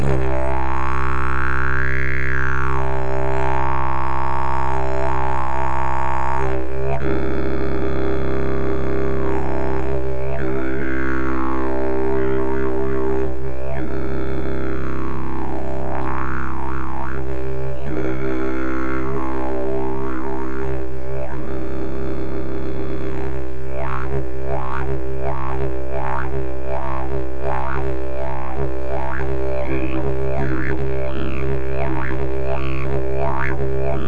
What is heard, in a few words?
didgeridoo; jilm samorost